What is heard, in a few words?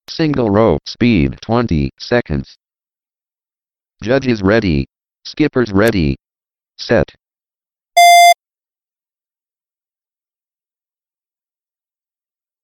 Single Rope Speed, rope skipping